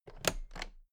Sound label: toolbox latches close soft double; industrie wirtschaft; handwerk